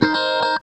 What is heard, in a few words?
GUITARS 130, House